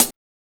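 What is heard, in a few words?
HIHAT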